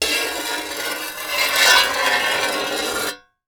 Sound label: Foley Metal Scrape Long